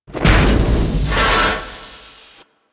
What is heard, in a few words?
Punch Vent; Cinematics; Orb Heist; Warframe; Venus; Finisher